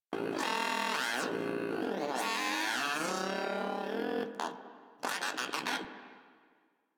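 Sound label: Moa Aggressive Vocal Cold Shiver; Warframe; Pets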